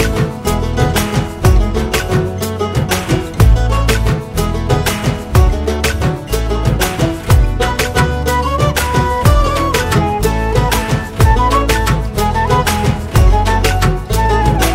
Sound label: new punjabi music ringtone